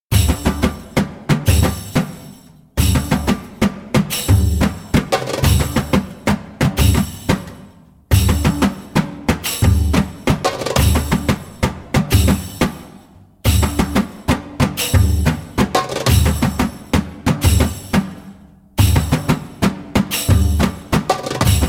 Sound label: Zaffah (fast), Djembolale; Djembolale - Grooves and Moves